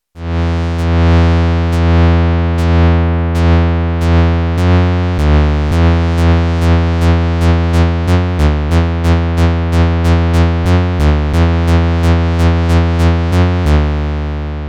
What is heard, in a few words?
JX-8P attack-decay